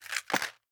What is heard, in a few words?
Match Box Drop; The Town